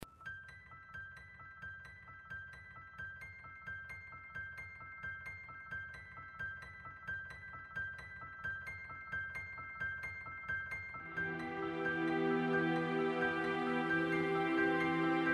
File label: Emotional